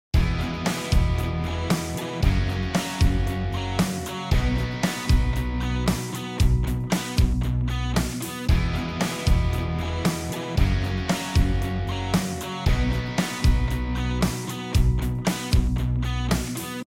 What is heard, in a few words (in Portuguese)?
harmonia IV V I